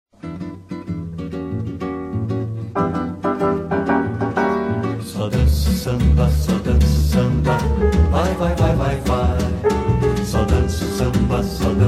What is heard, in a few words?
SAMBA